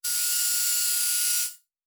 servo small, sci-fi sounds, mechanical

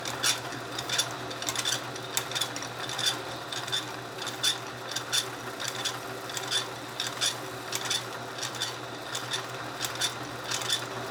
engine loop, sci-fi sounds, mechanical

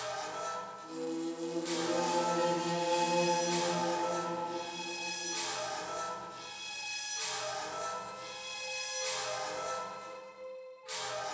Action Loop 130BPM, Classic Horror Sci-Fi Music; Dead Ark

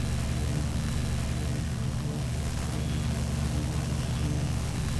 Weapon Loop (Laser); Sci-Fi Sounds

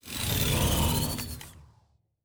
Door, Sci-Fi Sounds

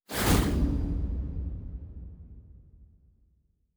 Complex Interface, Sci-Fi Sounds

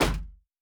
Metal Box Impact

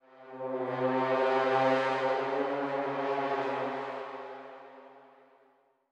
Action Transition, Classic Horror Sci-Fi Music; Dead Ark

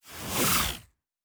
Synth Whoosh, Sci-Fi Sounds Movement

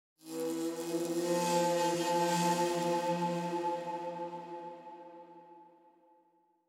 Action Transition, Classic Horror Sci-Fi Music; Dead Ark; Classic Horror Sci-Fi Music Pack; Action 1

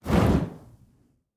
Weapon Shoot (Flamethrower); Sci-Fi Sounds

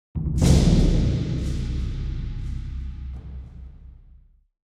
Action Transition, Classic Horror Sci-Fi Music; Dead Ark; Action 3

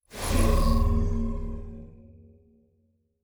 Teleport, Sci-Fi Sounds

